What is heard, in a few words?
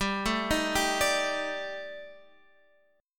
Eb Major 7th Flat 5th